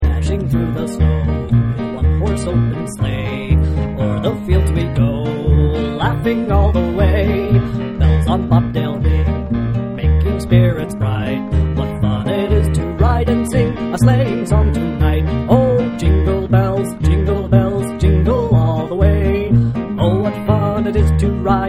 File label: Jingle Bells